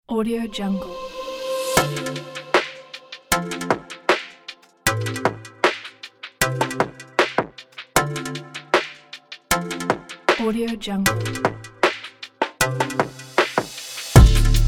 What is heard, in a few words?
urban marketing media